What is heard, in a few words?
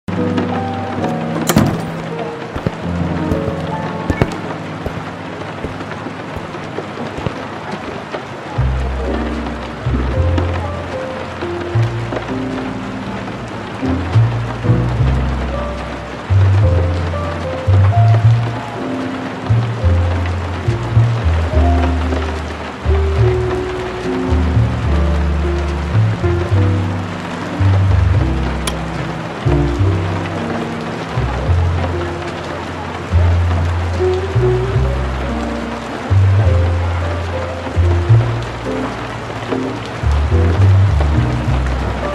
rainy night and jazz